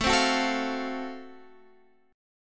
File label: Gb Minor Major 7th Flat 5th, chord